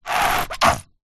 household shampoo bottle squeeze little out squelch